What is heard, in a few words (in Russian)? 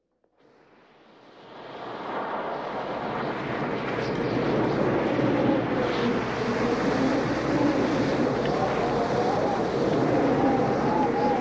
Uragan s poryvami i svistom